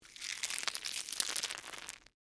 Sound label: Crunch Egg Shell; Texture, Crunch, Slow, Egg, Shell, Vegetable; BlastWave